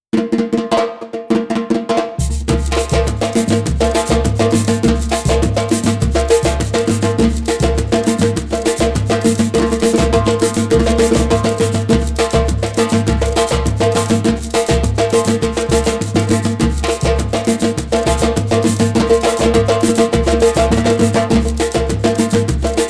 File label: timbalada